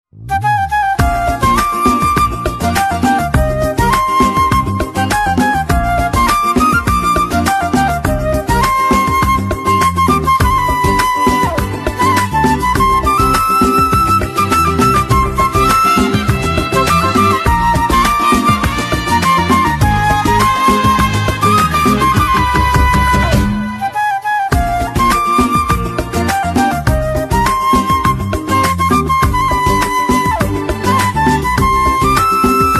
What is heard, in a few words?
Flute Instrumental Ringtone